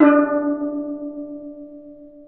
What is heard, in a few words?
D3 TENOR, STEEL DRUMS; AKAI S6000 CD-ROM; WorldSounds